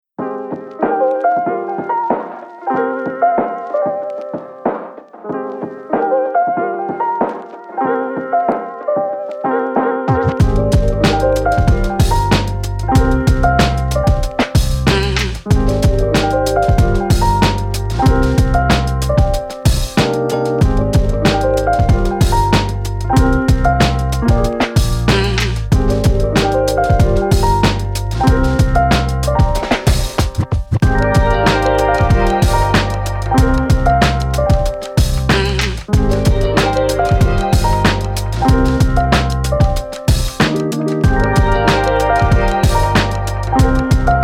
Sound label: vlogs background music